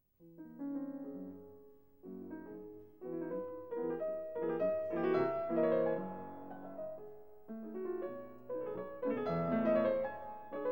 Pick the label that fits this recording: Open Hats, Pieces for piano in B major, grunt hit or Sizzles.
Pieces for piano in B major